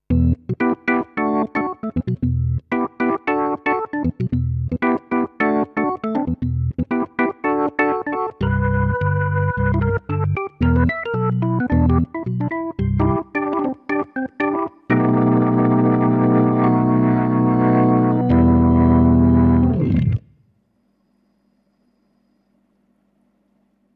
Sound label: Drawbars Perc